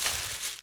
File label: environment zone thorny bush step